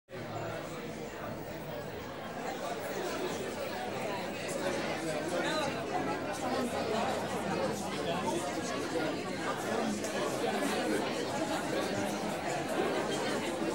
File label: Interior Crowds - Press Reception; Human Crowds, Children & Footsteps